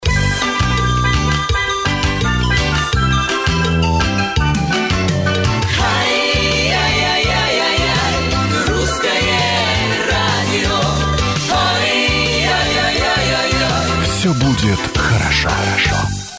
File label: Russkoe radio